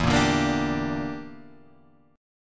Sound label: E Minor 7th Flat 5th E down